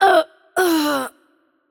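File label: Death, Spanish; bloom minigame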